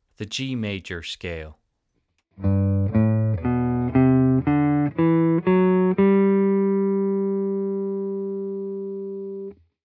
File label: G Major Scale